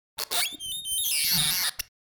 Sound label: Beeps Sci-Fi Space Machine Beep; Hollywood Edge; sound designer's toolkit v2